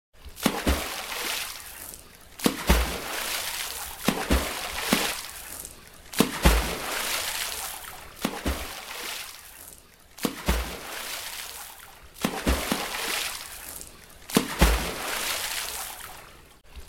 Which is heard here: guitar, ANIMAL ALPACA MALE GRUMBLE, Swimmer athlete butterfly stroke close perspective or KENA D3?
Swimmer athlete butterfly stroke close perspective